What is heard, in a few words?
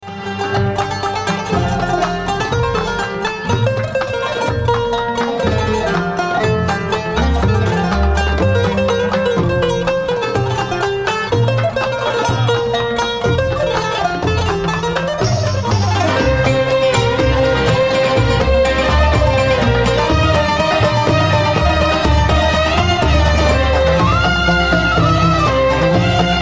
bayati medley; IBRAHIM (Michael)